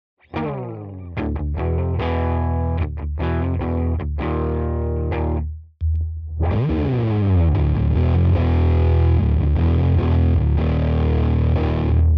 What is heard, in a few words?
MOON FUZZ Filter Scooped; min 9 12 15 max